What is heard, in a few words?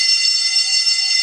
alarm bank